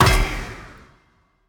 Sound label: basic hit; smite; chronos